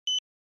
Cash Register Beep

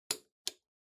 Stopwatch Mechanical Stop Table

